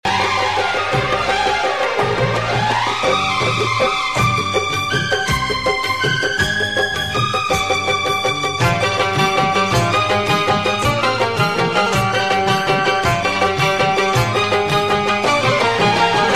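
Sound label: Typical Bollywood Music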